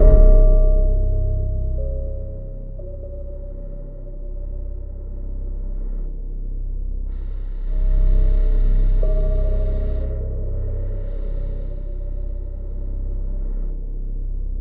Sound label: C1, Synth Line; 90 s Sample CDs; NiceBeats; SynthLine VOL-17